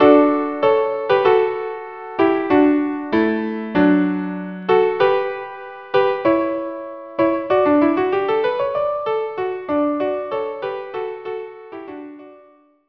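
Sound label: violin viola; joy to the world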